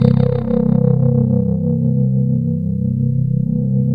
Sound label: PPG C1, OLD PPG; Sound Gallery - Charged